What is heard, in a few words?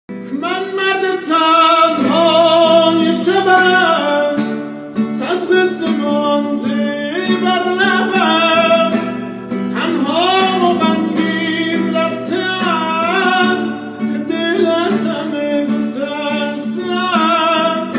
Guitar